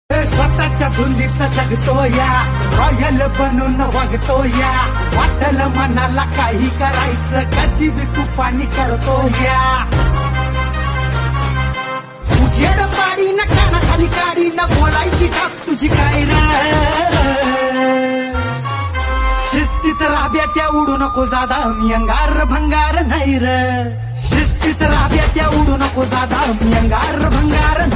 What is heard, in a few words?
Marathi Ringtone